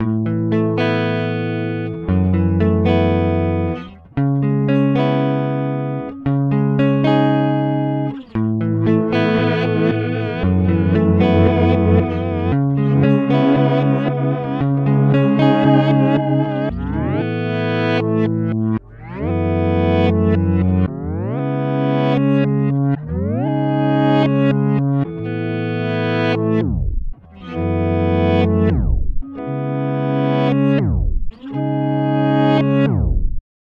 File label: Electric Guitar; non-linear audio reverser